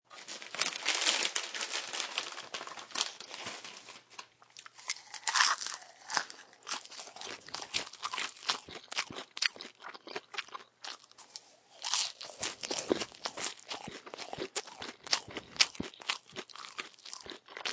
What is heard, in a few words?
Bag Eat Popcorn, Eating popcorn chewing sounds; Grab Bag Eat Popcorn 1; Human